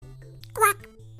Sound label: Quack